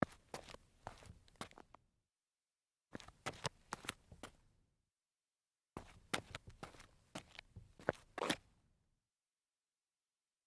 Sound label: sneaker sidesteps concrete